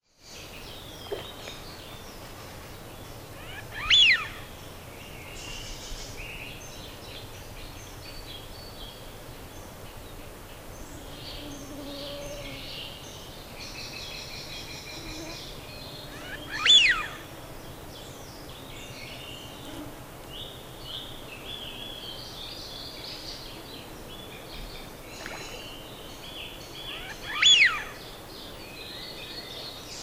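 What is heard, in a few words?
sviyaz voice, Eurasian wigeon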